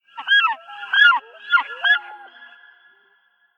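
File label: Plant Ext Random Lake Birds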